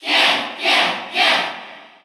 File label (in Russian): Cheer Russian; Ken; SSBU